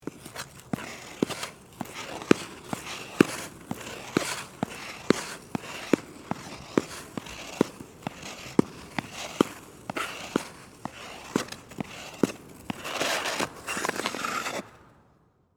Ice Skating, Sports, Ice, Skating, Amateur, Indoor, Ice, Rink